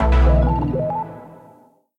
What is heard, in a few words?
win small; Rabcat; Classic 243